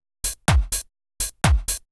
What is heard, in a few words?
HOUSE BEATS 125